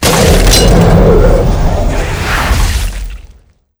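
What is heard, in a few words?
boss demonkin death, monster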